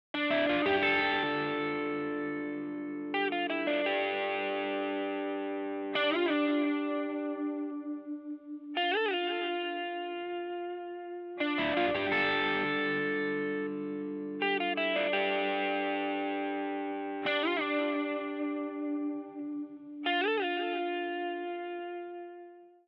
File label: guitar